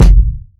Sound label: verby kick drum f sharp key